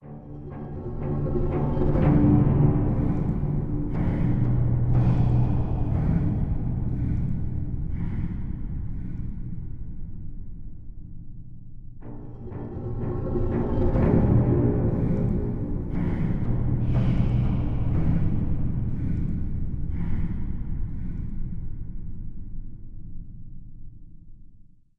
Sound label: Ruins Front